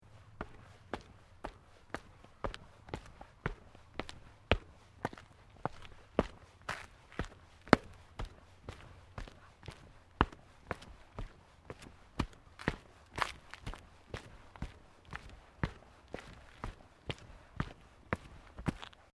FOOTSTEPS SNEAKERS STEPS CONCRETE ASCEND SEQUENCE; Footstep Foley; Footsteps (Sound Effects:Human)

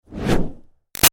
Fire Torch Whoosh Med; Flame Sound Effects; Objects

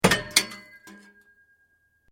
Metal Light Movement Minor

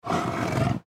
Leopard Breathy Growl; Roar; Big Cats; Big Cat Sound Effects; Animal

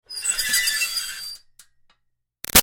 Screech Metal Iron Board Piercing; Ironing Board; Materials; BigRoomSound

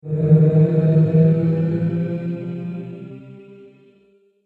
Creepy Creature Choir; Monster Sound Effects

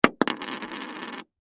Coin Drop Single; Money; Foley; Pirate Gold; Objects; Blastwave

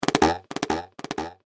Cartoon Boing

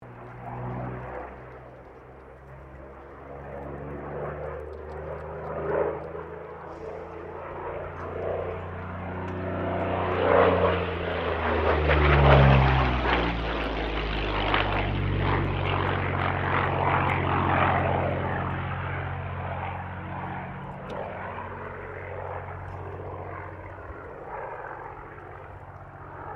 AIRCRAFT P-40 KITTYHAWK PASS STEREO

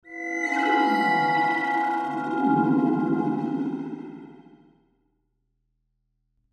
Sci Fi Swells Eerie; Blastwave

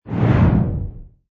Magical Whoosh Low; Magic sound of casting spell, conjuring or wizardry. Witchcraft ritual summoning elemental or otherworldly creatur

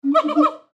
Monkey Colobus Hoot Happy; Animal; Monkey Sound Effects

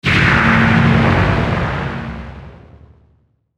Distortion Low Burst Chorus; Blastwave

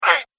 Mini Monster Growl; horror; Monster Sound Effects

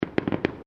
Fireworks Multiple Crackles; Fireworks (Sound Effects:Backgrounds)

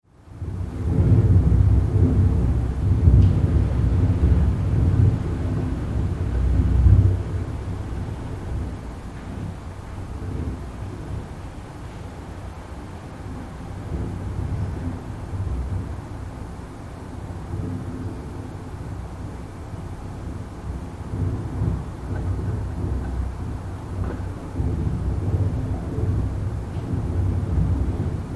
Wind Gale Force from Interior; Recorded from high-rise apartment - some interior movement and rattles

